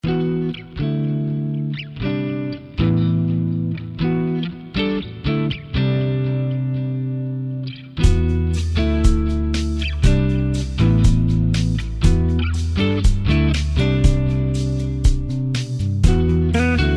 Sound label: guitar chant; nakha